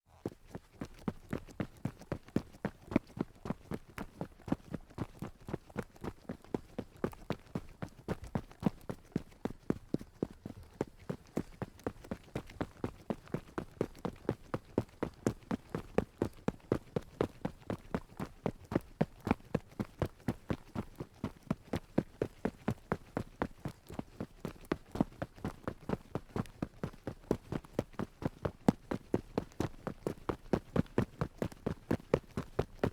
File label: run on concrete